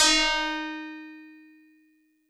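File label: Tubular Bells DX100 D sharp 1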